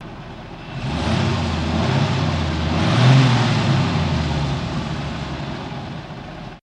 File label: Cadillac, 3 Medium Revs In Succession